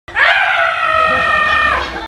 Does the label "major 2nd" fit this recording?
No